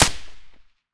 m4a1 silencer mono distant, weapons

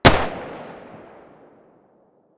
m1911 muzzle mono distant, weapons; colt

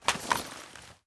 sniper draw; STALKER; IWP

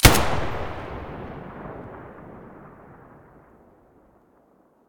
muzzle galil stereo, weapons

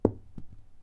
shell 39mm carpet; STALKER; IWP

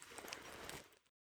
torch use; STALKER; IWP; item usage

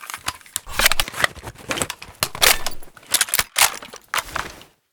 mg36 reload empty

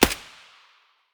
sig550 silencer distant; weapons

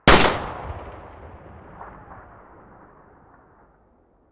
muzzle hpsa mono

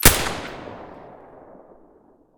silencer sv98 stereo

